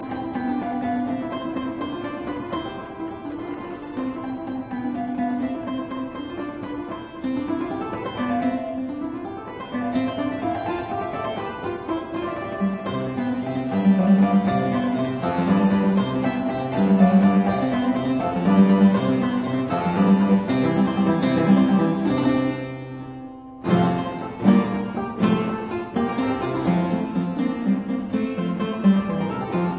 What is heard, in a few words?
Keyboard Sonata in G major; Soler; Rubio 45